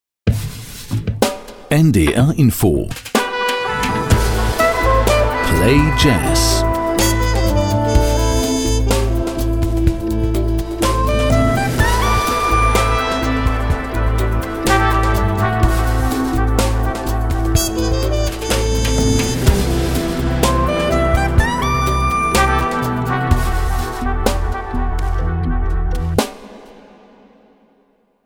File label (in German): Jazz Opener mit Musikbett; NDR Info